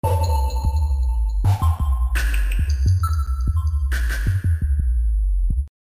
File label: loop